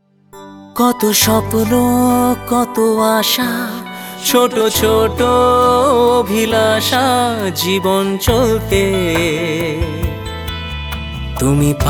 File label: jingle